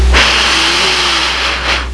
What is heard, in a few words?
Inhaling; Soundsation; Human